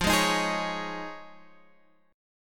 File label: F Minor 7th Sharp 5th; strummed